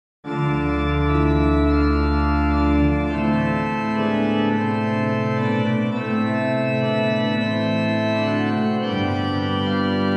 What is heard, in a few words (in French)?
Messe Paroisses Kyrie Plain Chant en Taille, Organ Music, Souvigny; Couperin Francois